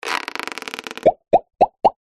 cartoon belly expand buttons pop x4

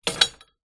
metal pole small lean against wall; foley

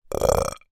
human male burp careful reserved

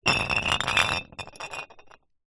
food drink beer bottle full roll concrete ground; zapsplat

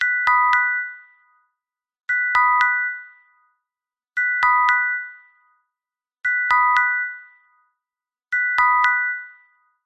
hospital monitor chime bell musical

